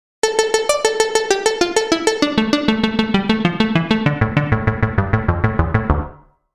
synth lead

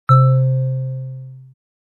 Marimba

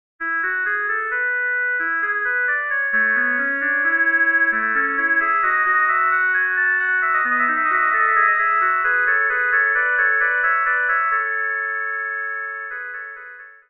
Oboe I + II; Bach Kantate 8

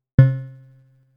Bell, synthlead

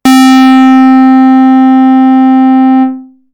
Basic Pulse, synthlead

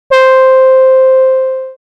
Dream Brass

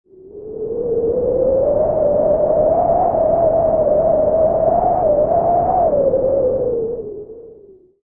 Stormy

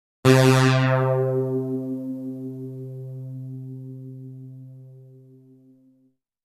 synthlead

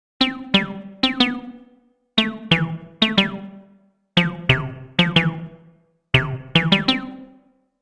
Reso, synthlead

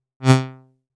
Violin A, synthlead

